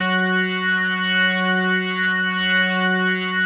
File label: F#3S, B3, Vintage Keyboards